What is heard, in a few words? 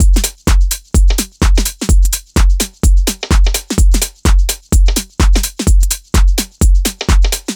127 Skippy Drums Full